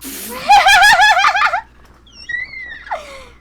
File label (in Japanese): 笑い